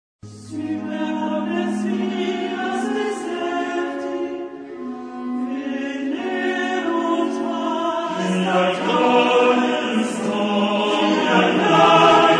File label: (male), chorus; Charpentier; Desolatione desolata est terra